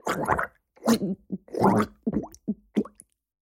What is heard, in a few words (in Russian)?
zvuki pererezaniia gorla